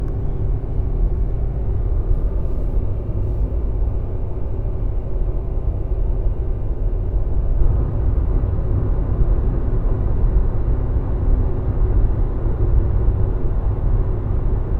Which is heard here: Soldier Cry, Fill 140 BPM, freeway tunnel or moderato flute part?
freeway tunnel